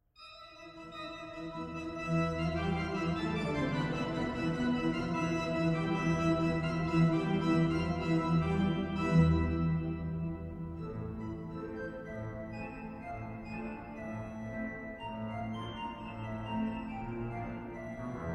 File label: Polka